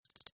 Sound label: multimedia rollover, Multimedia Rollover Tone